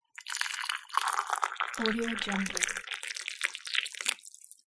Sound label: pouring tea